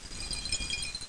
tinkle; Santa's Workshop - Advent Calendar for Computers

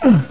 Umph; dewars; bar

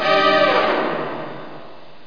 burst; NISSAN

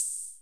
flip piece; gnome-games

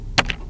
KEYBD

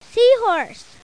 SEAHORSE SOUND; Ocen Explorers

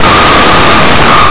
faucet

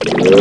Sound up; Funhouse of Love

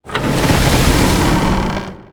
boat

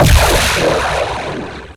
laser cannon

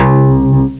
bass guitar, string instruments; Sound Effects for Multimedia - Volume 1

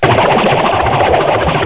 spin; The Ultimate Guide to Mortal Kombat

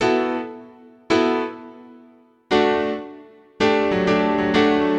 Synt

